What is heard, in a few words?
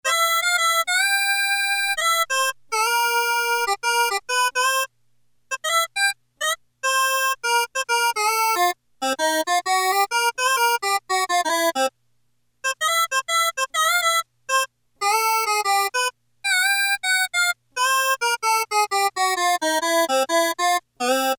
Blues Harmonica, Casio MT-600; Tones